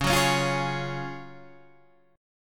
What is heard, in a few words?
F sharp Major 7th Suspended 2nd; 9,9,11,10,9,9